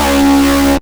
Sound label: Synths C#; Vengeance Samples